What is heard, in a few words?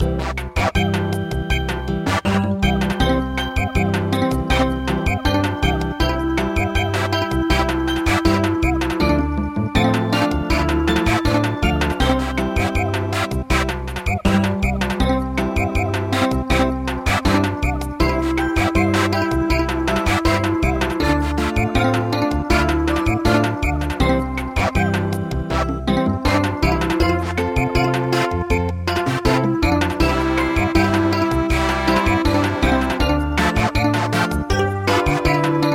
Samba, midi